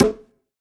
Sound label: Perc